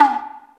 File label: TSUZUMI; Crypton - DrumBase5000; JAPANESE PER